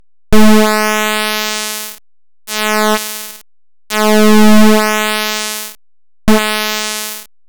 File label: pwm enveloping